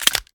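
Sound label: pistol reload fast mag out; gmod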